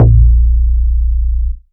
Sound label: Root A#, Ultimate Bass; Vengeance Samples